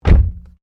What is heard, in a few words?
CAR SUBARU LIBERTY INTERIOR DOOR CLOSE; Family members Subaru; Cars (Sound Effects:Vehicles)